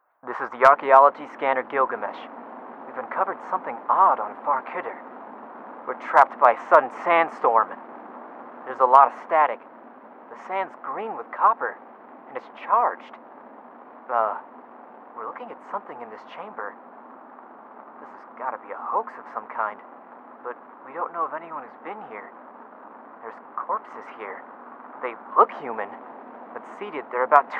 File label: Distress Signal; Mothership; Mothership Distress Signals v1